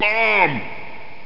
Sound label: bomb; blackhol